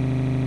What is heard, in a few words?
engine drive; simpra